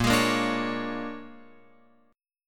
A sharp 7th Sharp 9th, Guitar, Open F; strummed; x,5,8,5,5,8; chord; JGuitar

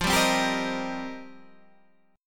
F 11th Suspended 2nd; 112:1,2,(5),b7,(9),11

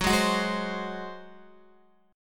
F sharp Suspended 2nd Flat 5th, Guitar; strummed; JGuitar

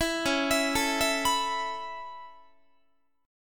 Db Minor 7th Sharp 5th Db Guitar; arpeggiated; 4A,4E,5A,5D,5G#,6C#

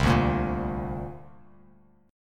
C 7th Suspended 2nd, C, down, Bass Standard; C7sus2 strummed; JGuitar

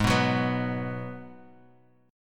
Gsharp Minor 7th; x,11,9,11,11,x,x,x

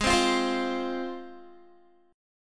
G sharp 6th G sharp down Guitar, chord; G#6; strummed

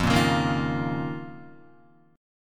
F 7th Suspended 4th Sharp 5th F down Guitar; F7sus4#5; strummed; JGuitar

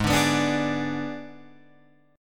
Ab Diminished Ab Guitar Open F; Abdim strummed; JGuitar

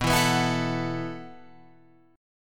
B 7th Suspended 4th B down Guitar Open F; B7sus4; strummed; JGuitar

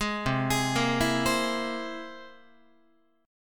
C Augmented 7th, Guitar; arpeggiated; JGuitar

